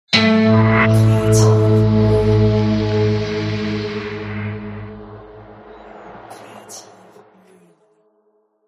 Something Ringing effets

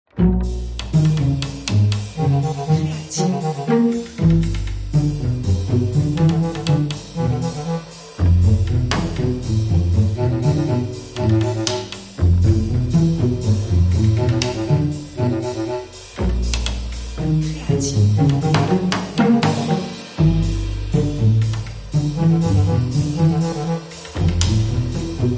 orchestre jazz

